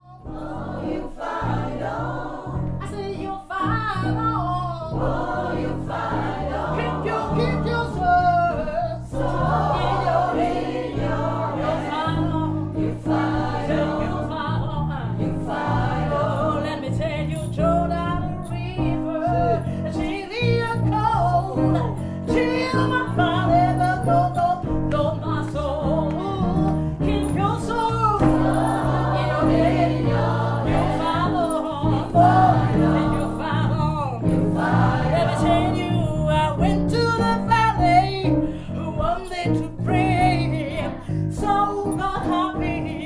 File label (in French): solos et choeur, gospel